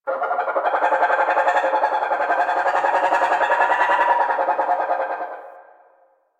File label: Myna Bird; Warframe; The New War; Zariman; Apartment